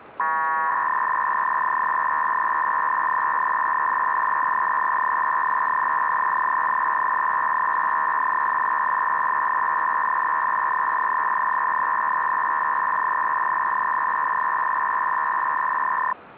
150 Bd, FSK modem; NOKIA BURST 150.6 Bd; NOKIA ADAPTIVE M85200 M/90; MESSAGING TERMINAL

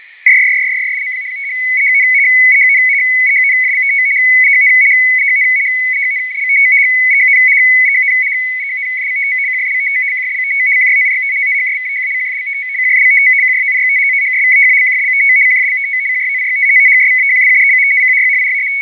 SINGAPORE NAVY 75Bd CRYPTO FSK SYSTEM

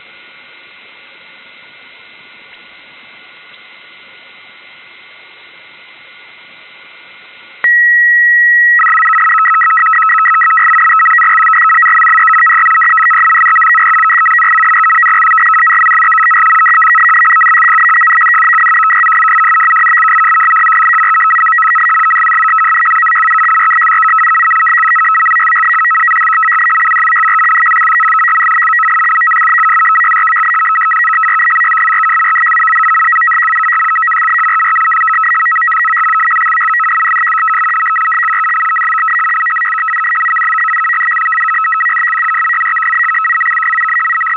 FSK 100

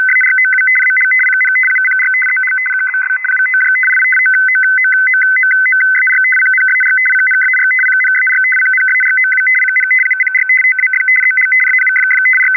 BULGARIAN DIPLO ITA-5/ASCII FSK SYSTEM; no error correction

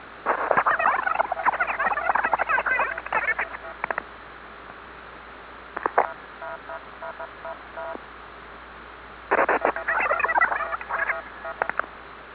MIL VOCODER; Czech MIL/DIPLO voice scrambler; CZE-MIL-DSP9000

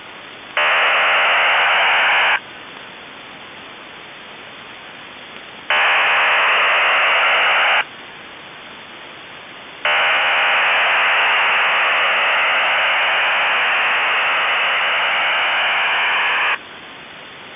TRC-3x00 Digi VX; DIGITAL VOICE

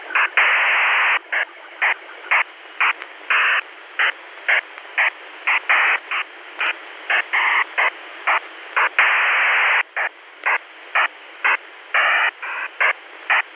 SLEW, PSK

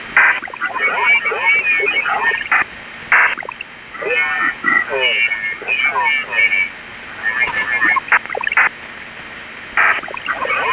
FFT VOICE SCRAMBLER; THALES SYSTÈME 3000

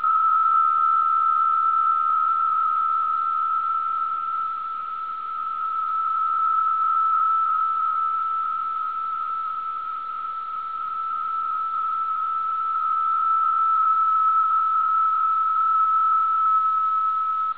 1xPSK 75; ISRAELI VFT; isolated 75 Bd PSK channel

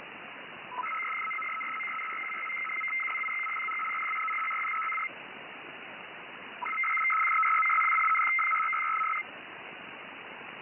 MEROD 150 Bd Clear and ACK; THALES / RACAL MEROD with plain text traffic and follow on ACK burst; THALES / RACAL MA4286; FSK-systems

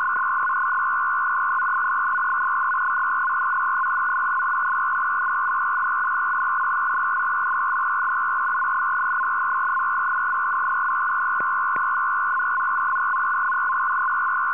ARQ-M2, FSK; ARQ-M2-242; ARQ-M2-342

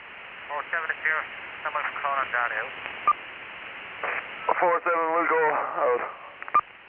CODAN PTT RELEASE TONE, CODAN 1200Hz

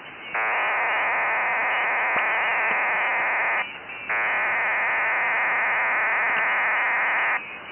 PACTOR IV 225 DQPSK

